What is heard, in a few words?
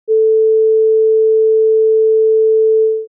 test